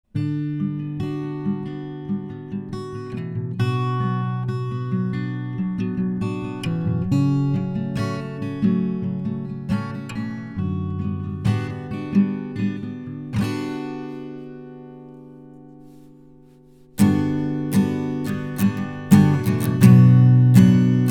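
arturia audiofuse studio guitar on microphone lewitt 440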